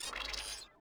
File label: Focus Out; Tom Clancys Ghost Recon Advanced Warfighter; XBOX